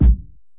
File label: step; Cleanomancer